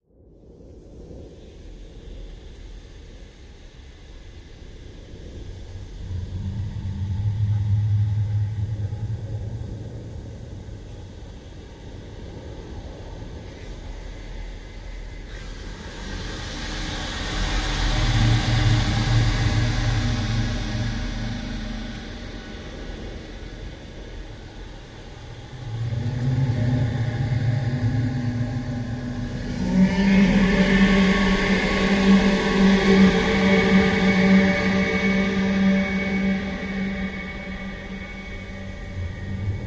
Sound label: Deep Hollow Tones, metallic